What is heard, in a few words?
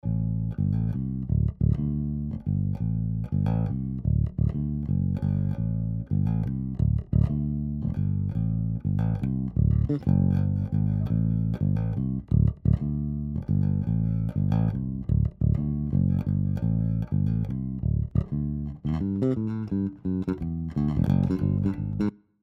Bass Vitalizer Bass Tight